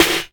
SD, Dance Drums; Best Service ProSamples vol.15; AKAI

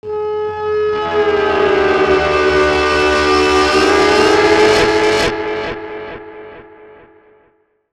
FX DIST; FX DISTO

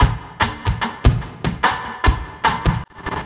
Rock Tweak, Funk Beats

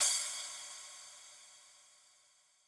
crash, grime, 140bpm; Zero-G Sound Sense Grime Scene; Construction Kits; Its Primetime

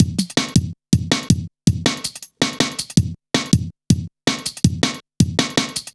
Tinkle, drum loop, jungle, 161.5

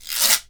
guiro, Synclavier Percussion, World & Orchestral

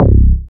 BAS, Eurotech; Sounds Good

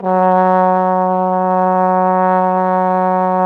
trombone; Sound & Vision - Gigapack I CD 2 (Roland); Brass&Woods2

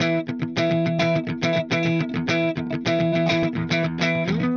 Clean Funk Guitar

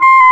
WND CLARI, Clarinets, Clar Bryt; Roland LCDP04 Orchestral Winds; 90 s Sample CDs

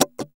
GTR SCRAPE, Clean E.Gtr, Strats; Roland L-CD701; Clean E.Gtr4; PYB Strats

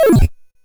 Drums, Hits; DCM8

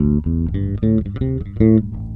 FREE 111 A, Bass Grooves; USB Soundscan vol.30; AKAI

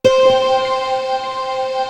CANYN, 01W BELLS; East West - Global Sounds

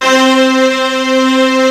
LUSH STRINGS, ENSONIQ VFX; Rubber Chicken Software Sample Bank

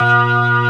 B3 C 2, ORGAN; VOCAL ORGAN; ORGAN #1

